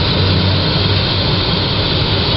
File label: chop idle; quake2